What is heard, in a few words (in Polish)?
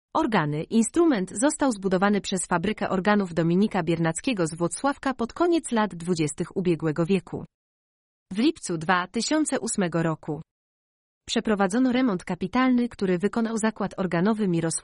organy instrument